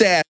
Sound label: vocal shot e; HIDEO